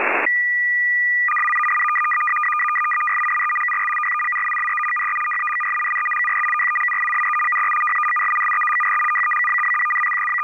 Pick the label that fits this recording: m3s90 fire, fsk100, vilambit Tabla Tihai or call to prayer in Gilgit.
fsk100